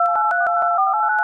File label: Windows Startup; First 1976 Release; Windows Never Released Soundpack 1; deviantart